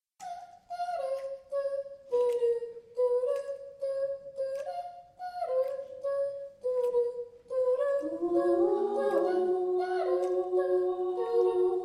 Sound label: Mashup, Pop Voices; The Barden Bellas; Pitch Perfect